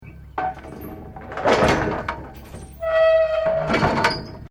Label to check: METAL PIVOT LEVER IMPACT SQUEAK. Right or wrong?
Right